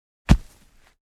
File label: Footsteps jumping landing on sand